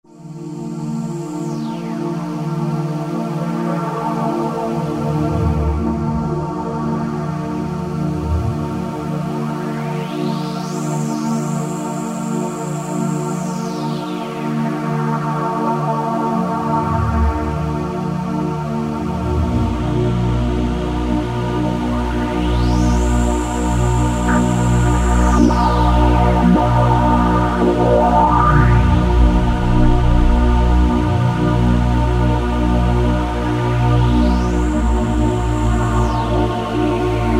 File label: D-Mention, Roland SR-JV80-11 Techno Collection